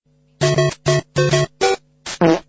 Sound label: fart; mario